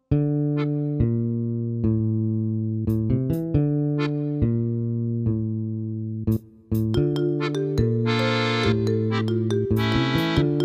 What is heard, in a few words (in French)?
ensemble vocal variété jazz chant du monde